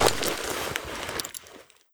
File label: awp draw, weapons; csgo; ro2 k98